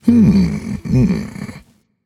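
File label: thinking, nonverbal; smite; khepri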